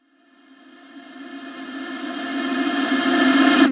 Reverse Vocals F; Vengeance - Essential Clubsounds Vol.4; Effects

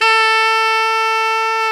SAX TENOR, Super Sax Tenor mf; Roland LCDP07; Tenor mf&ff

